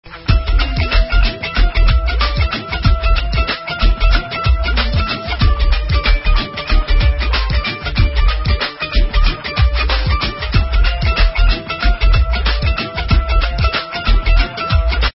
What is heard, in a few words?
Tumbi Dholl, Msg Ringtones